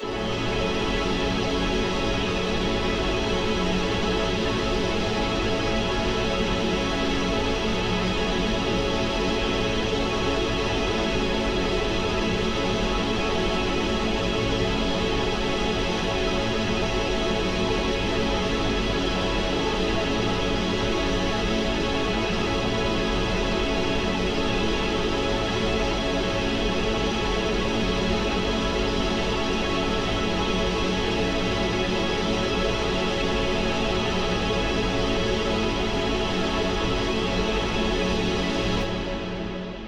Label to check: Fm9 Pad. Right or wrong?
Right